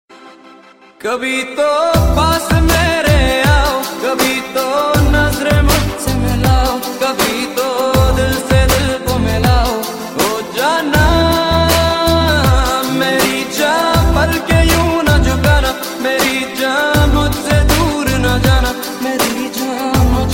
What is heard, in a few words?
New Sad Version Song Ringtone